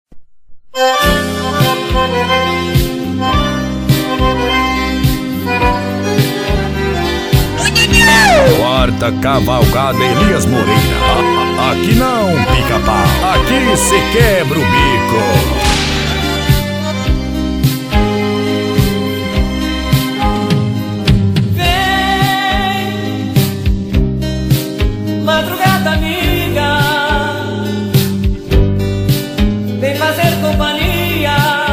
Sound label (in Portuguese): sertanejo classico